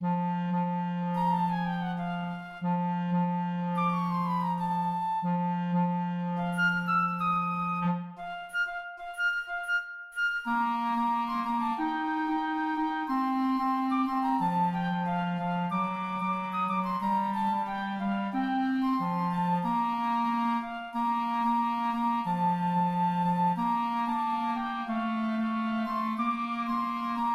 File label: jazzy we wish you a merry christmas